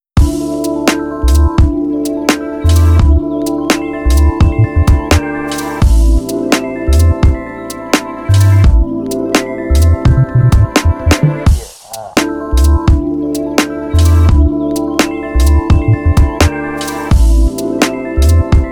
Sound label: Lofi Type Beat